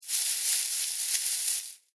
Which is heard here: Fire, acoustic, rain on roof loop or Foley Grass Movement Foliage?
Foley Grass Movement Foliage